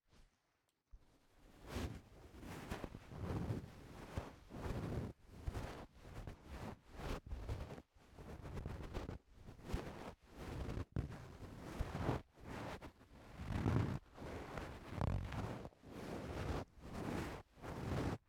耳穴を指でぐりぐり＆タッピング

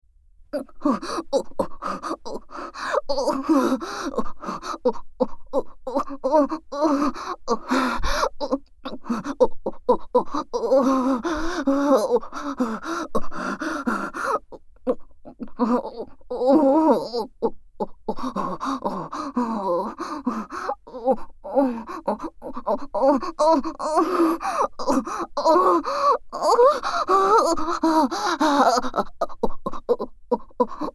超密着オホ声喘ぎループトラック, ASMR; 低音爆乳ご奉仕メイドさん

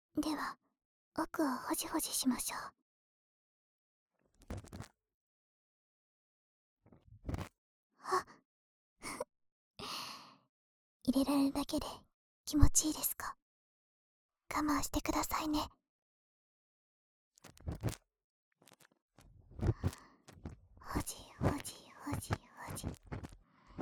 左 奥耳かき, ASMR; アンドロイドメスガキ

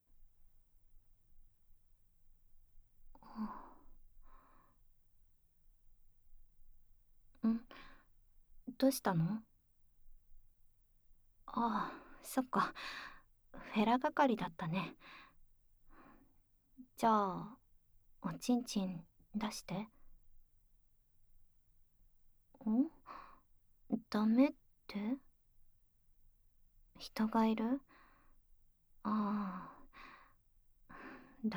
朝からフェラ係～ダウナーフェラ＆お掃除喉奥ぐっぽりフェラ～; 早苗さんは僕のフェラ係; ダウナーJK

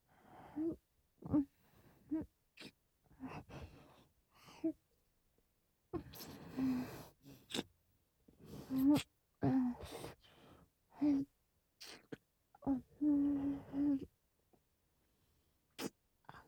乳首弄りループ 乳首甘噛み; あなたにメス喘ぎを叩き込む、アルティメット乳首開発ダブル!!!

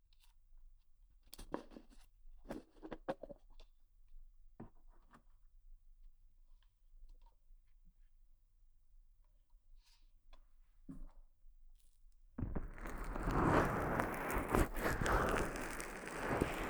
耳 の 揉み込み マッサージ, 音だけ たっぷり (声なし); 【安眠的17小时ASMR】为现在就想睡觉的你哄睡的治愈少女。让大脑放空的全套安眠(现实掏耳・按摩・耳语)

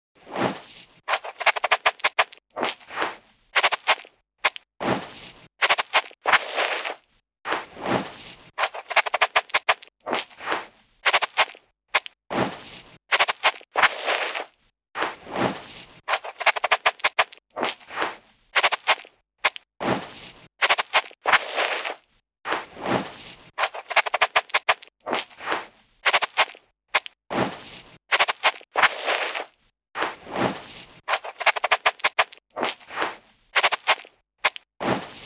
人の手では決して届かないお耳の最奥を重点的に刺激する (右); エボリューション

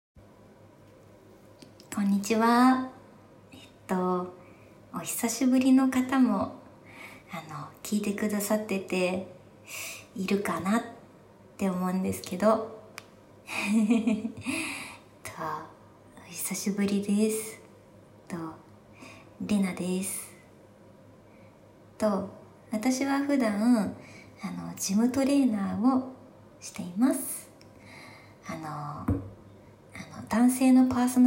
おしっこオナニー イキすぎておしっこが止まらなくなっちゃったよぉ; 【初めてのおしっこオナニー】; 聞かないでぇ!!!; 【THE おしっこ TAKE】; 【ジムトレーナー】

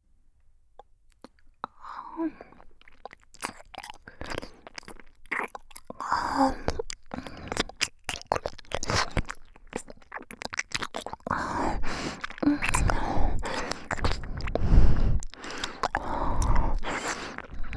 甘トロ密着耳舐めループトラック, ASMR; 奴隷エルフ姫

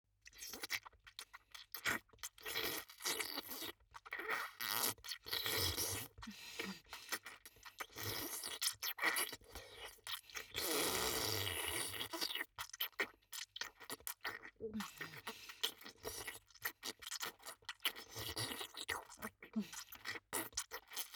フェラ, 真面目系 OL; ソロ

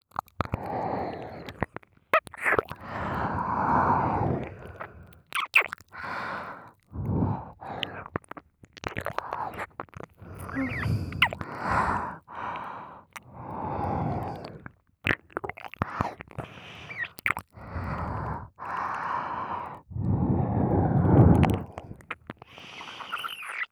ASMR, まったりMIX片耳

